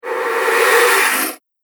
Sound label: Spell Of The Stygian Witch, magic sound effect; Fantasy; Andrew Fleming